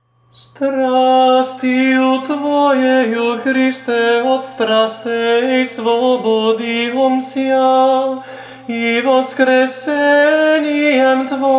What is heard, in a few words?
Apostika prvi glas, Serbian chant